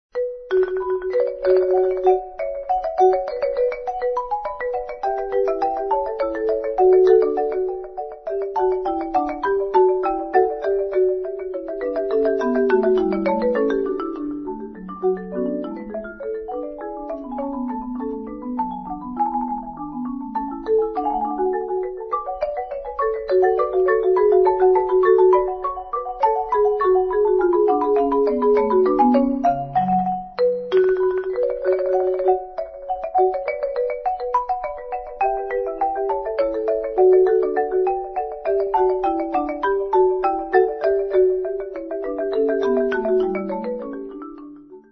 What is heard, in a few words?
gigue; classic; jsbach